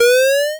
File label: alarm